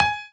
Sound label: piano melo